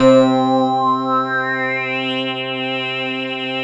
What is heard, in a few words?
SYN D50 SYN, Roland; Sound & Vision - Gigapack I CD 2